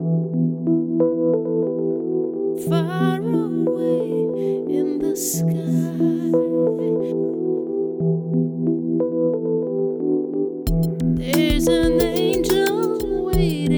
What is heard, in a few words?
Vocal; Vocal Attack